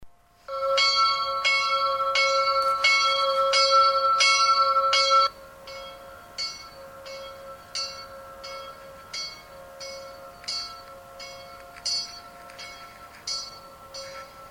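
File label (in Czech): zvon, kaple sv. Kateriny, Kutná Hora; Vojtisek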